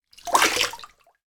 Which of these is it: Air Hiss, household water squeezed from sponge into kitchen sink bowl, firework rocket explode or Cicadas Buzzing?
household water squeezed from sponge into kitchen sink bowl